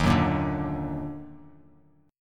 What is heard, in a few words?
D sharp Minor Major 7th, Bass Standard; strummed